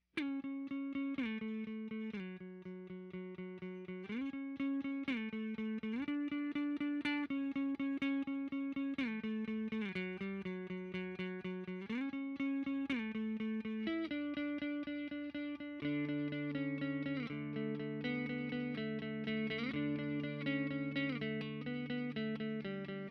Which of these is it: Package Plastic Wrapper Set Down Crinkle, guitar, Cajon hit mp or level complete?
guitar